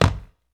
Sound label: STAM, Human Perc, Body Perc